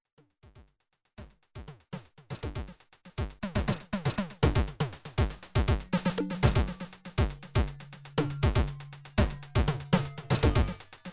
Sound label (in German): Static Machine Chorus und Delay mit extremen Einstellungen